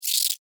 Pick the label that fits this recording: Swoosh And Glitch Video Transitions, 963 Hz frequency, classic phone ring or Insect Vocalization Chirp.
Insect Vocalization Chirp